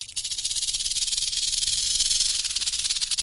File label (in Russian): shum gremuchej zmei korotkij zvuk